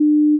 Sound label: error; EU-Utility